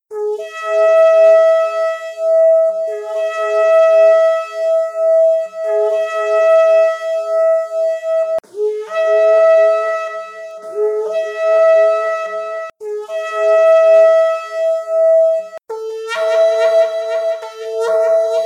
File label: Three Horns